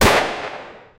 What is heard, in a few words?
a single gunshot sound; firearm